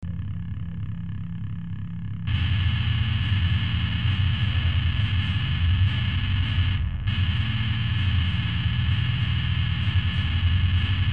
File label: riff